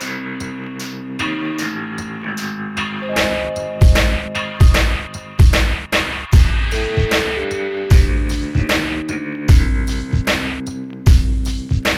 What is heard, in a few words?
Bpm76